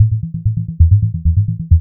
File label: INST LOOP, House Side Of 2 Step; USB Soundscan vol.51; AKAI